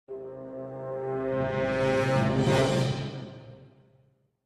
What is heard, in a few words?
crescendo finale